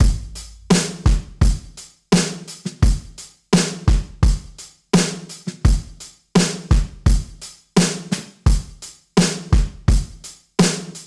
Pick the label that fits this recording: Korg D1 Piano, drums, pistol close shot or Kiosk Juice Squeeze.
drums